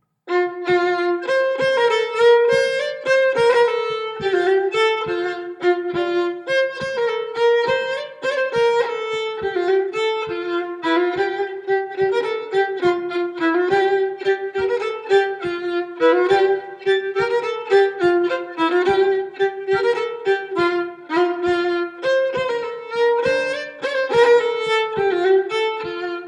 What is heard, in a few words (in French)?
Montagnarde; Recueil Hainl; Montagnarde de Sauxillanges